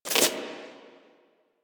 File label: Screen Glitch